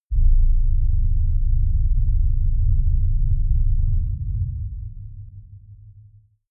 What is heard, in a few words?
avalanche rumble